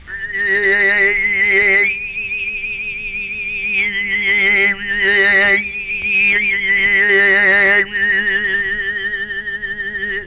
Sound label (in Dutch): tuvazang